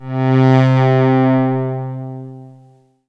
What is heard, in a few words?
Yamaha TG55 Stereo Pulse C3